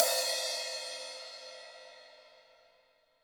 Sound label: HiHat & Crash, Roland TD-20; HiHats